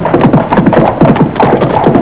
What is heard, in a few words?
gallop